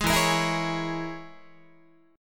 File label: E 6th Flat 5th E down Guitar; E6b5 strummed; JGuitar